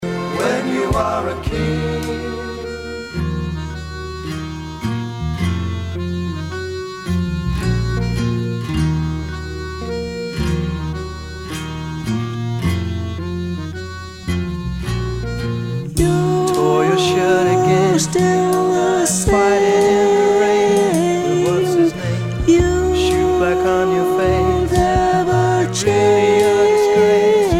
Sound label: Extra Soprano Part; Choir Harmonies